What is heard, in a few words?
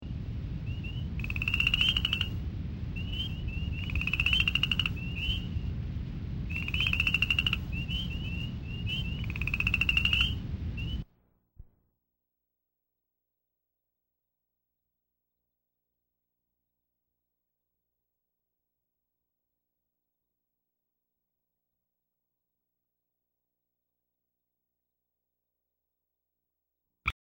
Upland Chorus Frog